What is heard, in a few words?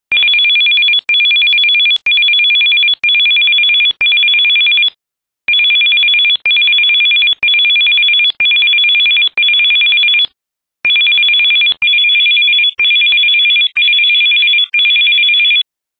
telephone ring; ringtones